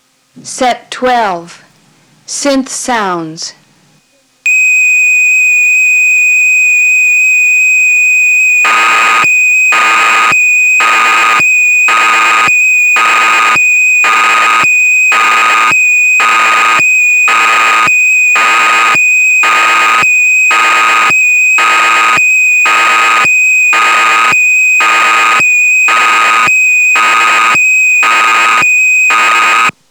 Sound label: Synth Sounds; dx9